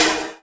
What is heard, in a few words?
Korg M3R Industrial Snare